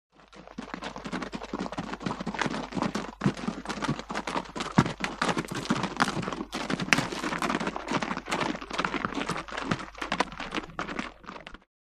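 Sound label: By 3 Horses Walk Up Past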